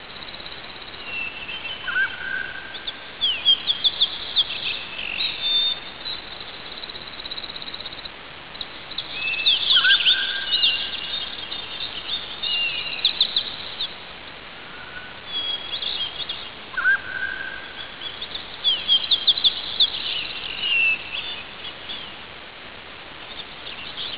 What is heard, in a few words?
Bird; i-mobile; PANO-DC5210